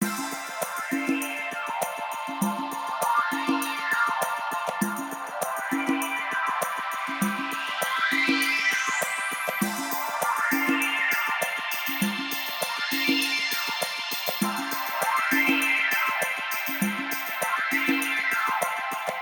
background; Diamonds On Fire

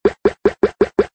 BOAT MOVE; Sharky

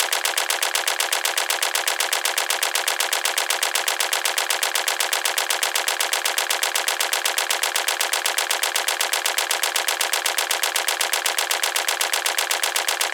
big win increment; Wild Guns

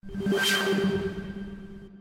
scat draw; Great Book Of Magic; Wazdan

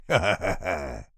voice laugh; Sheriff Of Nottingham

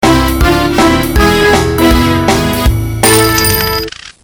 bonus end; Grand X

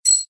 CHIME; Book Of Ra DX6

